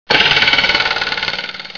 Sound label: twang